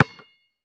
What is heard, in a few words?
Street Ball Bounce Concrete